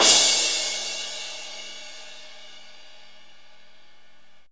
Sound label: crash; East Collexion; Drum 1 Dry